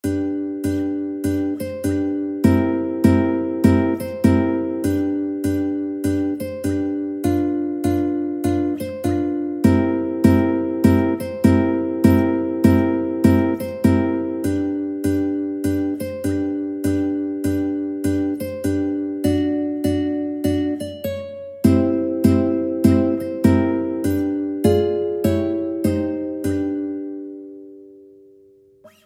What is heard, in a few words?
ukulele blues